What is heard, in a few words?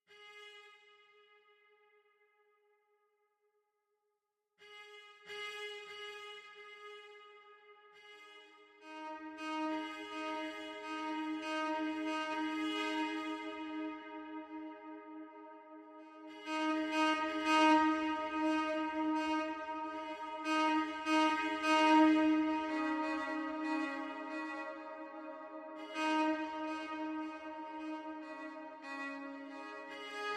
Echoing Cellos